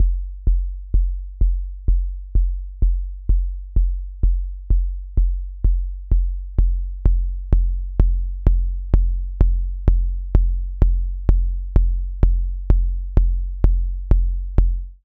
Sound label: kick drum attack, Akai Rhythm Wolf